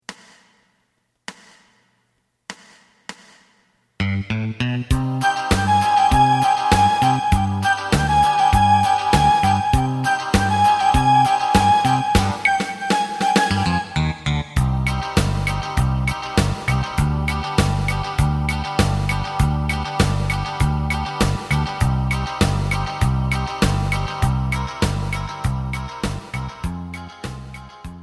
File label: lead